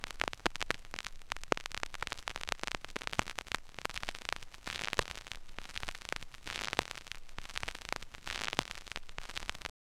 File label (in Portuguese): disco vinil tocando, vinyl